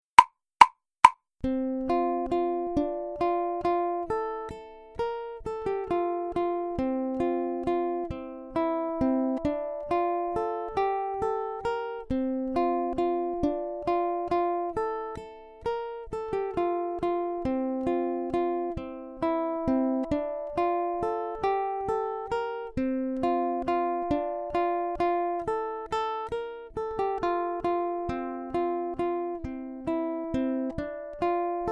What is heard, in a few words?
Accompaniment, ukulele; grade6